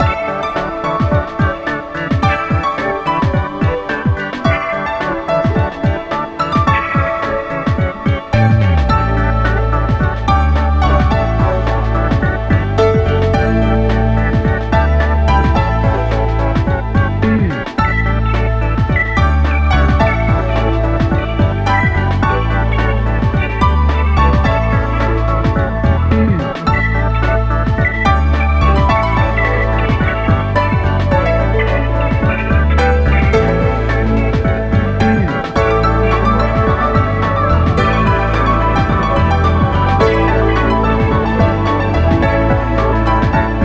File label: Water; Heroki